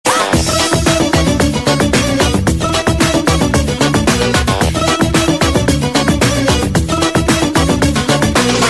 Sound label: arabic music